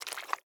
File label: footsteps mud squish